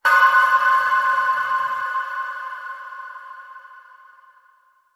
Afterlife Mysterious Ping

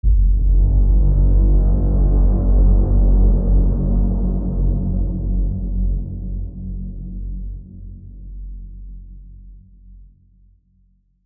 Silent Threat Subtle Bend

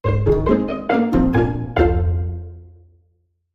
Frippery, Sting

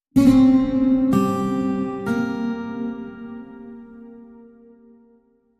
Solo Lyre Sting

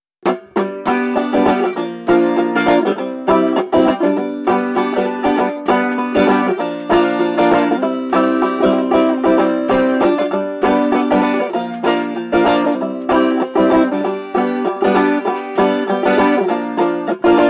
Playful Banjo Only

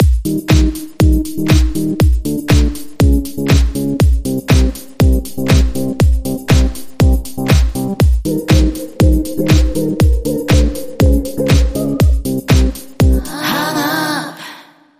Out Beat

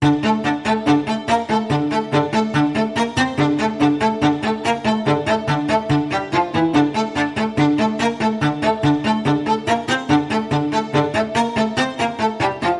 String

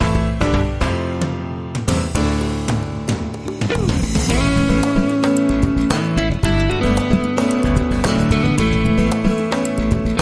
Globe Watch Promo

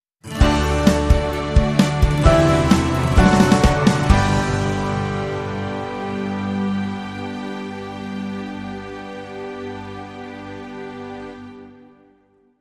Sting No Lead Guitar

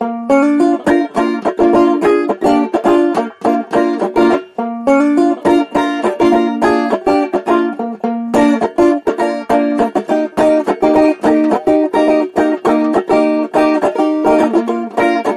Happy Party Banjo Only

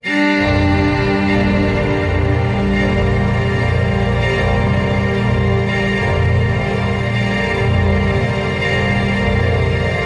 Ambient

